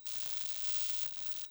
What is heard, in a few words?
drums